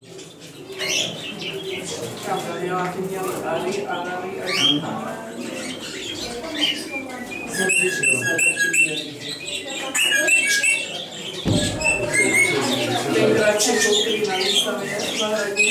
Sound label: barnardius zonarius macgillivrayi; north